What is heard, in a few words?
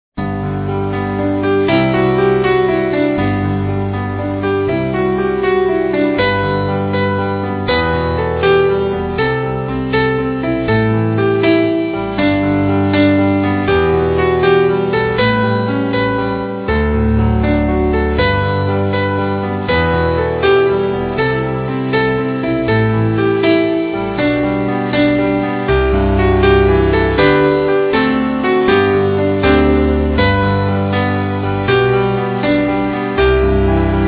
Instrumental